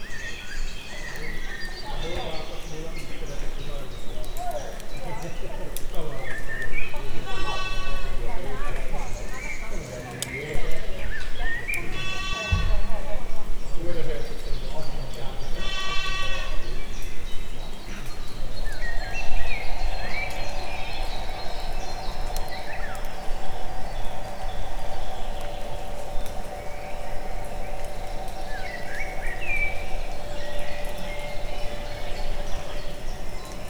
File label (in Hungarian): gidamekegés fáról alahulló hernyók; Egerturistaház